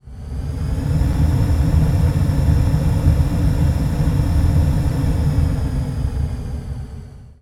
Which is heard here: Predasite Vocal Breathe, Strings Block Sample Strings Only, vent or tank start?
vent